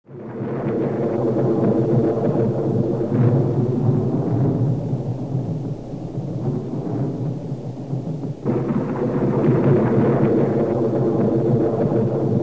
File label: close rumble